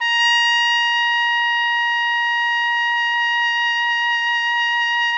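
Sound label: trumpet; Roland - LA Composer vol. 3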